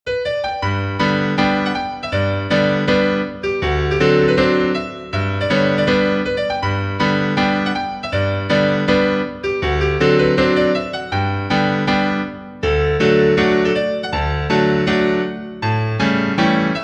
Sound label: Polka piano